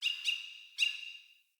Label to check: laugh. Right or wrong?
Wrong